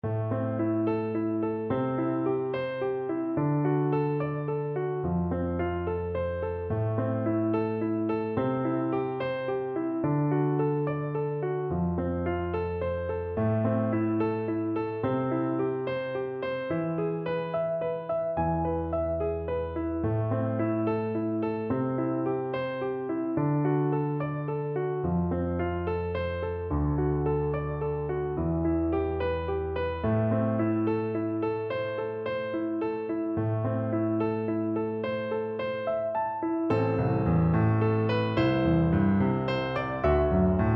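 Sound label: recorder karaoke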